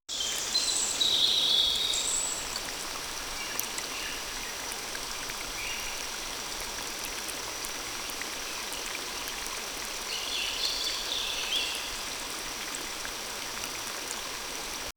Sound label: enchanted forest ambience, bird chirping